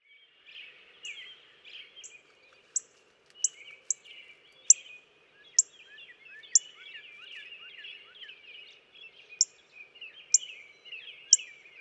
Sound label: Northern Cardinal